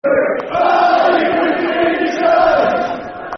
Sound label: Football Chants (Soccer Songs); Are You Grimsby In Disguise; Hull City Hcfc